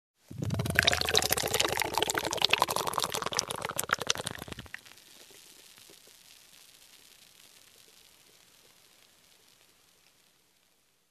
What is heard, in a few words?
Soda Pouring sound